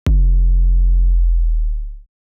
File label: ambient